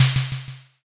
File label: snare